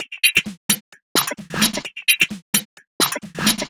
Groove, 130 BPM, Percussion; Sonic Foundry (Sony Creative Software); Underground UK House & Electro

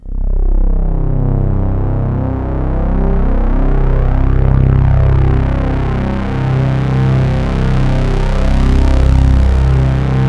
PAD C2; USB Soundscan vol.05 - Explosive Jungle; AKAI

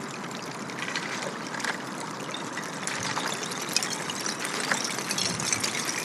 Icy Stream, On The Glacier

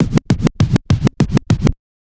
Scratch, Trance; Sonic Foundry (Sony Creative Software); Trance NRG VOL-1

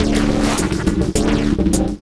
Bumpers; Sonic Foundry (Sony Creative Software); Esoterik Beatz; Shaj Fx

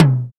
TOM, TOM Dance

